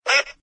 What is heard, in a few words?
duck; DuckShooter